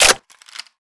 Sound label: m4a1 clip in